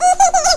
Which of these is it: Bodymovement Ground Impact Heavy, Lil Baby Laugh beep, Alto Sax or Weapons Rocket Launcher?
Lil Baby Laugh beep